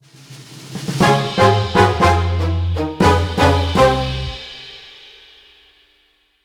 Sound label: Stingers Death; Mechstermination Force